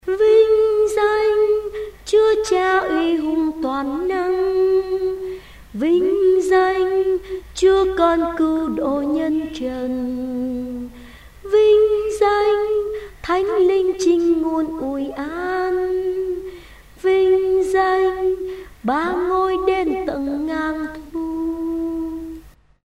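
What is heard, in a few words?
soprano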